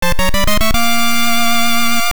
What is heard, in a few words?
Fanfare Essence; "Get Essence" fanfare; Zelda Oracle